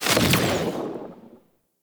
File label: Proj Explosion; Warframe; Noctua; Weapons